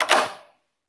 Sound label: key; todee and todpee